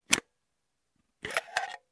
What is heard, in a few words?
Vintage Camera Shutter with rewind sound